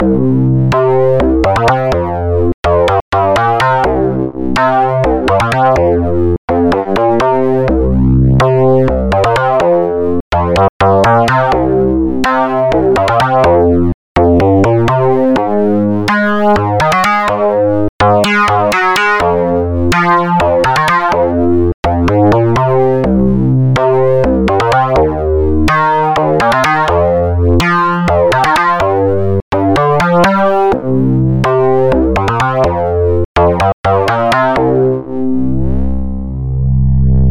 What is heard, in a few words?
fm tonal